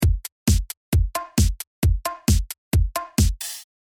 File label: Drums